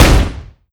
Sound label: grenade shoot, weapons; tf2classic